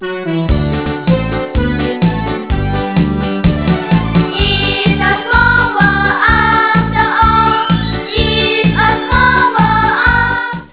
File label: children